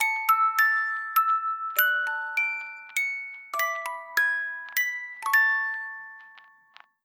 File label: Merrygoround Melody; Cookie Run Kingdom